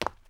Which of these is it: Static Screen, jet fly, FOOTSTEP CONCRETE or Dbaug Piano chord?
FOOTSTEP CONCRETE